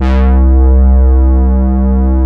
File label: Poly800 C1, Korg; Back In Time Records; Korg Universe VOL-1